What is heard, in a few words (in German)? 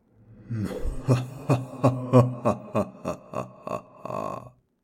Ghost, ertönt ein finsteres Lachen; Gespenst